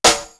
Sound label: TAM SNARE; East West; Global Sounds